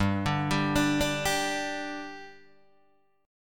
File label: G 5th G Guitar DADGAD, chord